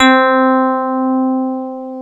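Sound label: FM Rhodes; Piano Layer, Grnd Rds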